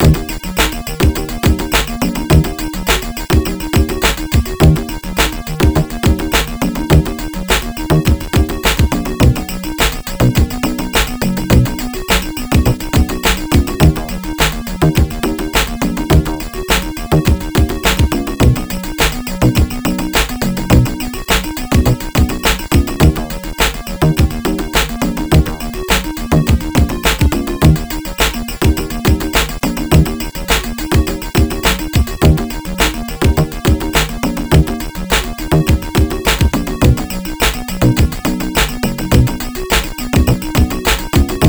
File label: Scoreboard Groove; prime time nfl nfl 98 genesis